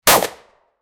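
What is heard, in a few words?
9mm pistol single shot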